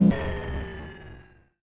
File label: turret shutdown